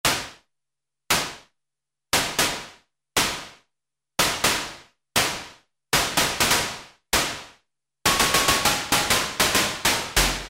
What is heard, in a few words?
Snare Drum, Roland Juno-106; Factory Preset